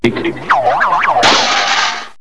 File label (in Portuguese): FRIGIDEI; 7invasores